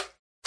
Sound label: slime block; minecraft